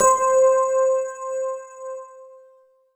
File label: Ensoniq SD-1 Electric Piano C6